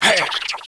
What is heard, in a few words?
Gold Beetle attack